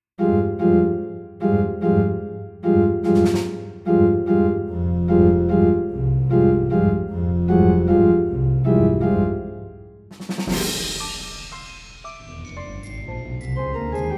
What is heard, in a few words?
waltz punch